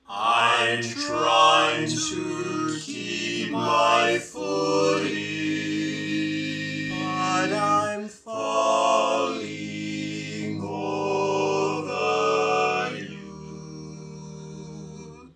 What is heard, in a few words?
Lead, barbershop tags